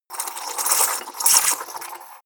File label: milk frother; berufe